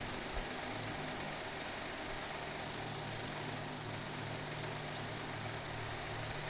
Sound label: Old projector